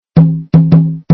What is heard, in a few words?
drums